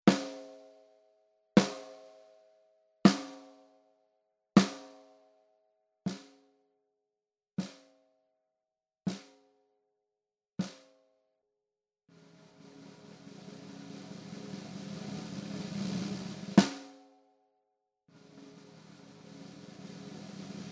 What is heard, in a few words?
Puresound Steel 30 strand, snare wires